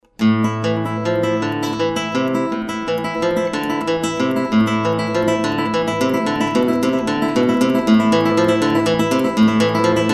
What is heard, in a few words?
Guitar Asturias